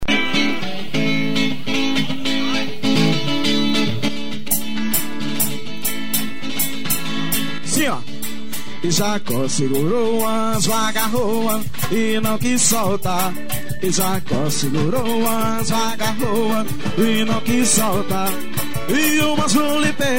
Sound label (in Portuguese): ao vivo, adoracao